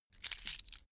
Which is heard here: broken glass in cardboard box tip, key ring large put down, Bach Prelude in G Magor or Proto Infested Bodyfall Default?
key ring large put down